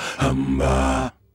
Male Voice, African Voices

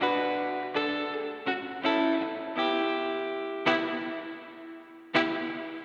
Soul Guitar, 70s Analog Funk; Sonic Foundry (Sony Creative Software); What it Is!

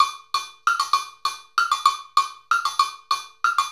Cow Bell; Sonic Foundry (Sony Creative Software) - Essential Percussion

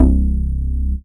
DM BAS, Dance Mega Synth

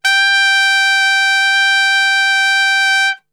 G 3 PIC STR L, Brass; Best Service; Brass Super Section

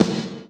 R-50 DRUMZ

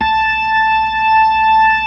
B-3; Roland LCDP10 Keys of the 60s and 70s 2; Full Xfade

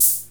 NOISE HH; Back In Time Records; Korg Universe VOL-1; DRUM FAMILY

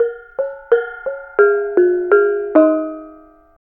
BONANG; Spectrasonics; Heart of Asia; BONANG PH

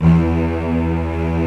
LO ST STRING

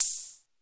Cr-78 OHH, Dance

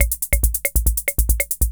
140 POPS

